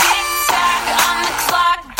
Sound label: tik tok button